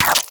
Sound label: Infested Punch Life Steal; Kick Punch; Weapons